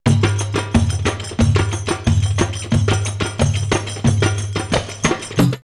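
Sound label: El Amein 90, drumloops World Ethnic; X-Static Goldmine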